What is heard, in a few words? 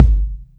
Kick, Music; HapticSamples; Feel; NiceVibrations